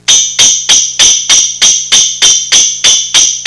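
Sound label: sleigh bells, sirens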